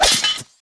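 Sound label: Soldier die; Kara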